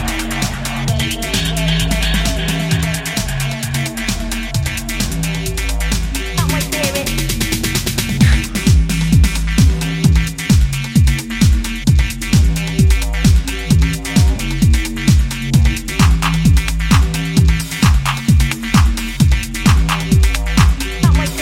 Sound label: Beat Ultra Bass Mix